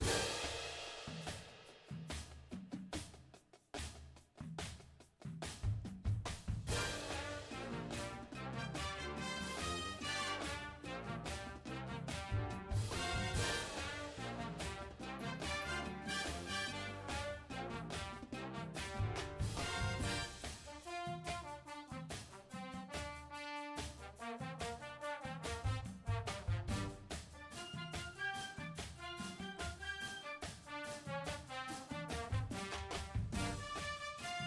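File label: cymbals with music, drumline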